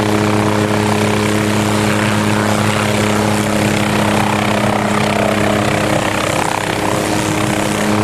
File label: Heli Loop Ext, Helicopter Vehicle